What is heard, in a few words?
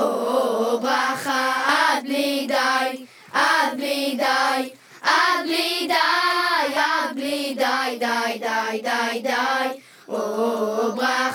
vocal loop children's choir